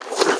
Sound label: Draw; Lara